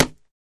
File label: Boxing Glove Hit Slap